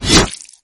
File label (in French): piege